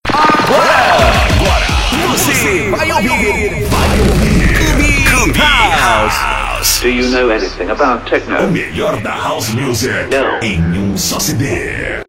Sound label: CUBE HOUSE